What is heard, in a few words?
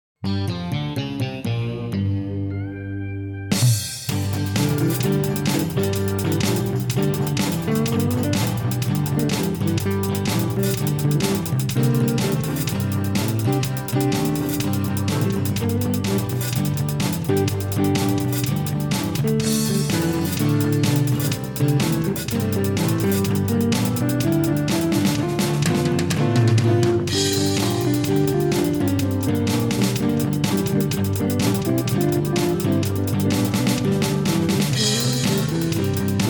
Blues